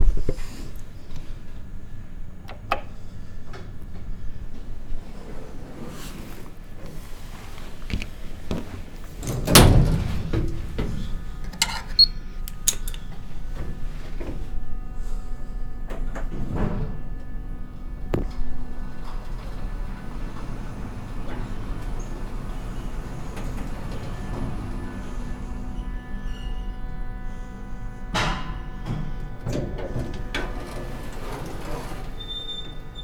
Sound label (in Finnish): hissi osittain